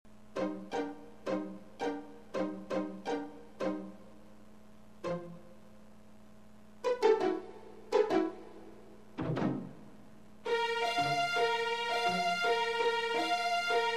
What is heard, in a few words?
Creepy Raindrops MIDI